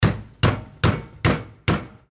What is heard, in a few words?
hammering